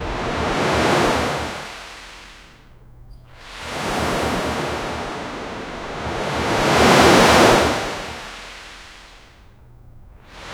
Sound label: light splash of a wave; calm